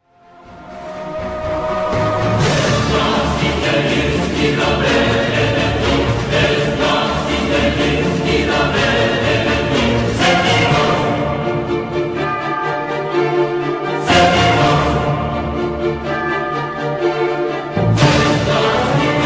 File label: horror mix